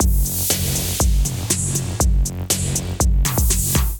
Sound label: Big Break Kit; Drums; Ableton; Build and Drop